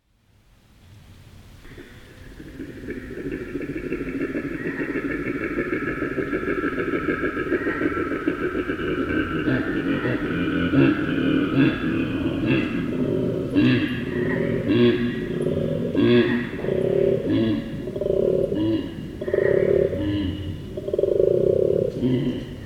Koala Sounds

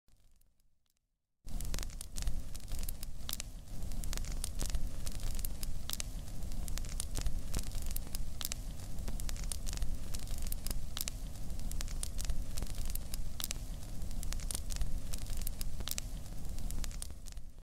Paper burning; سوختن کاغذ

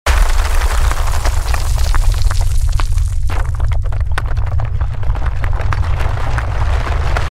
Cracking ground; سینمایی

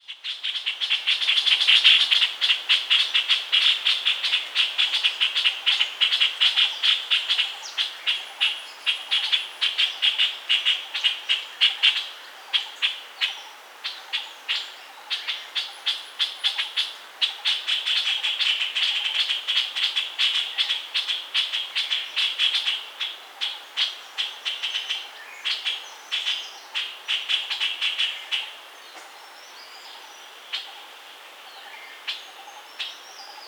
Puff throated bulbul

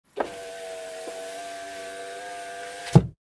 car window, بسته شدن شیشه ماشین

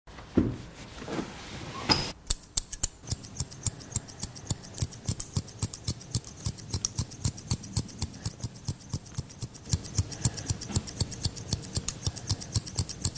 hair cut asmr; اسمر کوتاه کردن مو

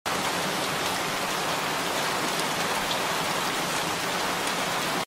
Rain on roof

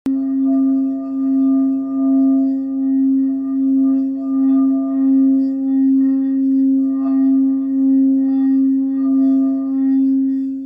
Root Chakra Music; موسیقی چاکرای ریشه

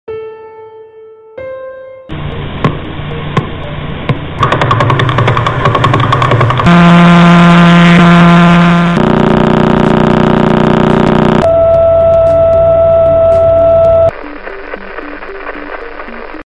Pulsar; ستاره تپ اختر

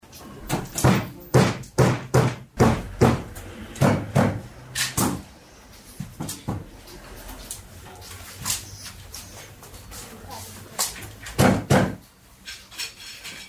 carpet weaving; قالی بافی

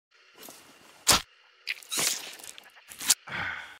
ampoule, آمپول زدن

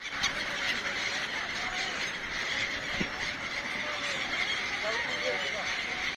flock birds; پرواز دسته جمعی پرندگان

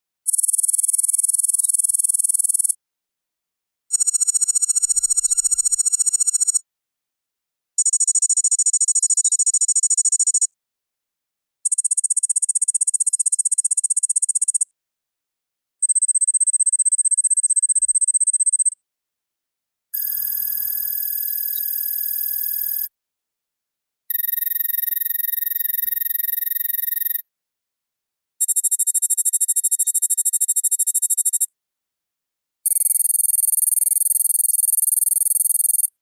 Digital text

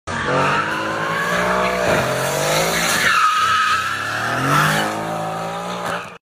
kmc, اگزوز ماشین

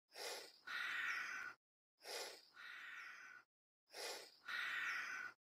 Chameleon Sound Effect

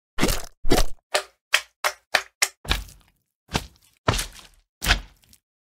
Slimes Minecraft; اسلایم در ماینکرافت

